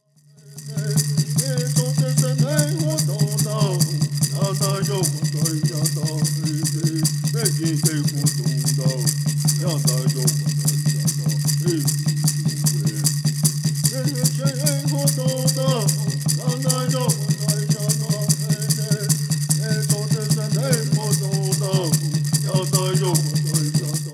Song

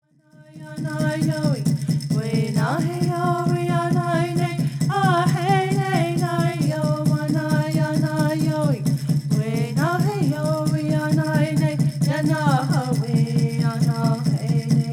Straight Song

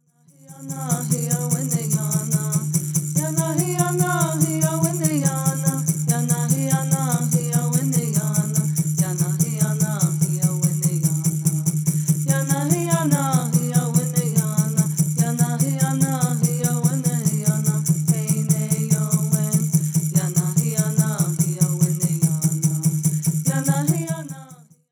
Peyote Song

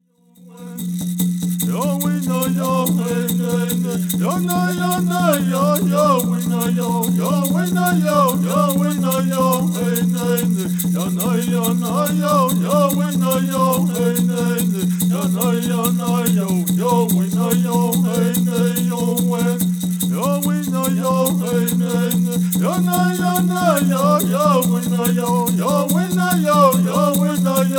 Peyote Song

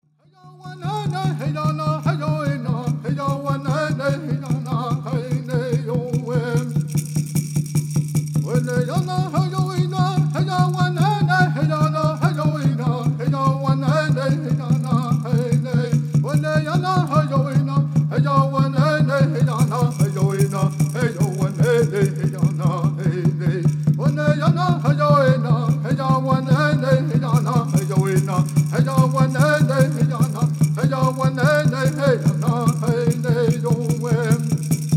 Native American Church Song

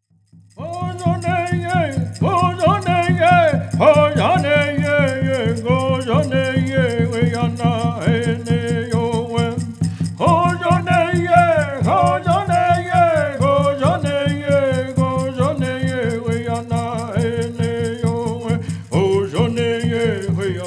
Apache Peyote Song

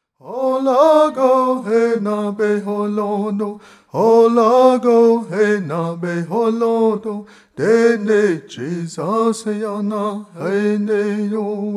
Dineh Prayer Song

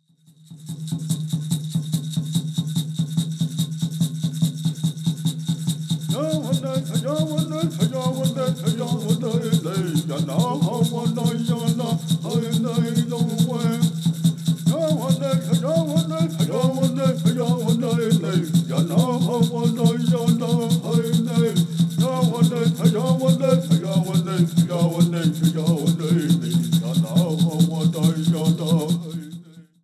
Peyote Song

